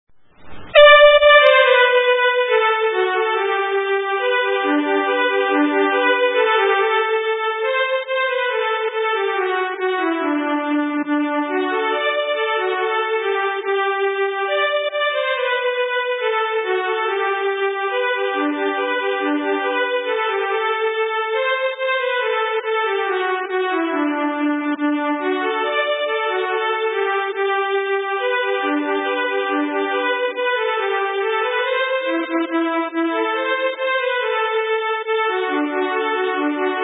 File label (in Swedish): Schottis; fr Porjus